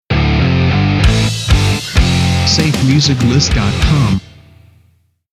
Werewolf Stinger

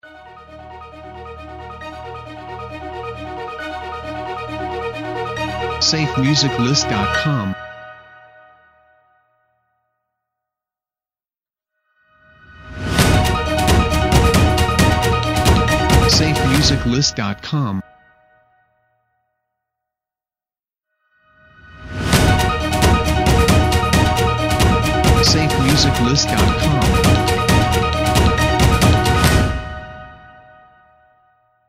Dramatic Cinematic Intros; SafeMusicList

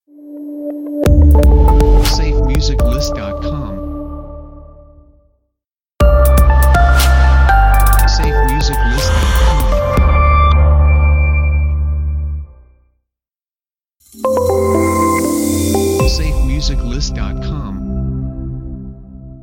Short Modern Business Audio Logos

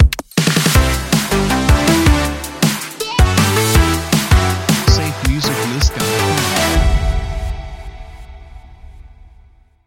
Party Starter Intro; SafeMusicList

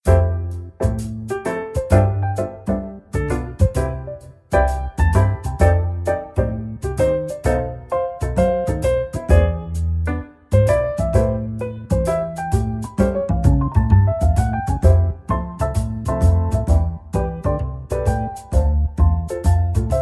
Evening Jazz

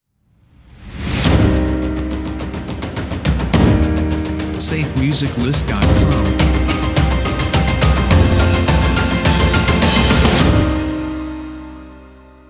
Dynamic Action Piano Opener; SafeMusicList

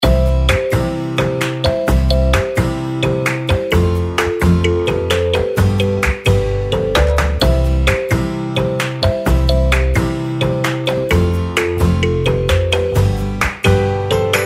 Happy Clappy Day; SafeMusicList

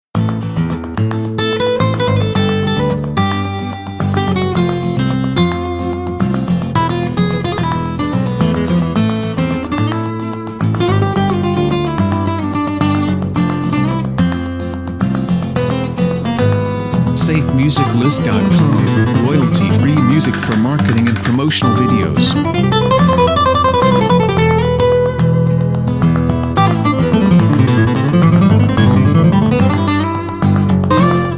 Flamenco Guitar Intro; SafeMusicList

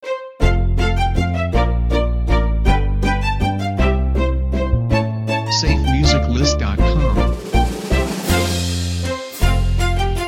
We Wish You A Merry Christmas Intros

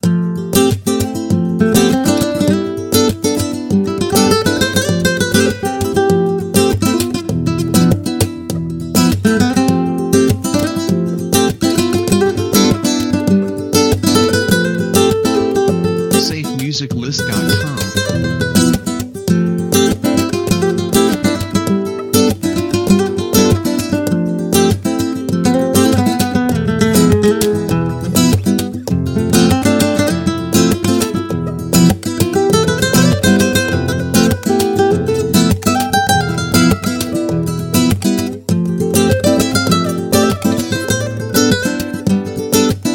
Passionate Flamenco Guitar Theme; SafeMusicList

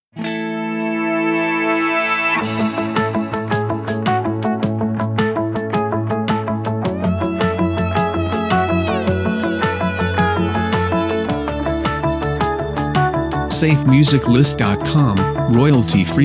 Winning Plan

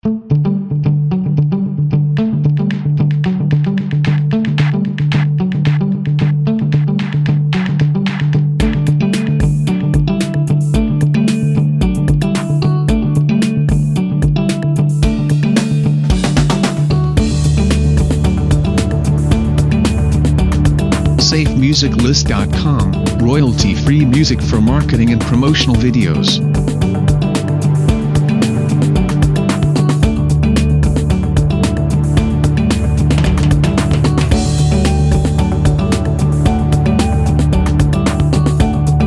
Positive Waves; SafeMusicList

